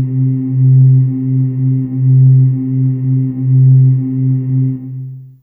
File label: Oohh C3, Humans; Fairlight CMI IIe